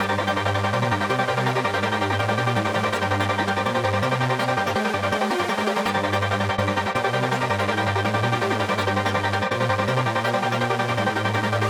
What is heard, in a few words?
Main Riff Synth, modern metalcore